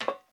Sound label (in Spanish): Zapato tacon escalera metal; Yesterday Origins